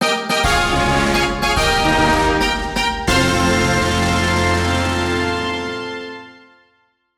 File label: Achievement Fanfare; Kirby's Return to Dream Land Deluxe